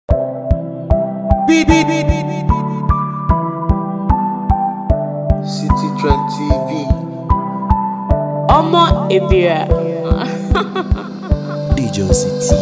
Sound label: Mara Free Beat